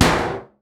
CAN SHOT; Best Service Hyper Dance

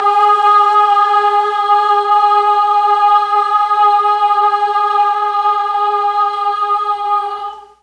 G#2 BOYS CHOIR, Mellotron; Mike Pinder - Mellotron Archive CD

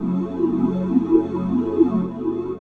Chords Tones; Equipped Music; Loungin House

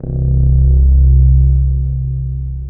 BASS R, Drum & Bass 160 BPM; Ueberschall; Drum & Bass Flash Trackz

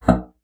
BASSOON; Xsample VOL-6

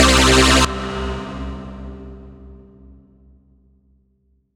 Stab C2, Club Techno; Zero-G; Stabs

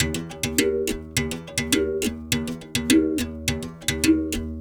Tar, World Percussion

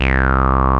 BASS, Analogon; A-BASS 2

